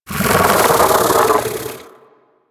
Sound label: bug vocal alerted; Warframe; Hunting Critters; Inf K Drive Bug